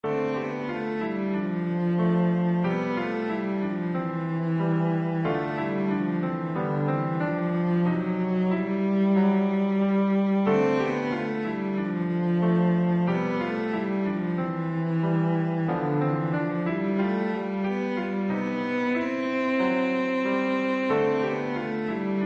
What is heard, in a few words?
skimming cello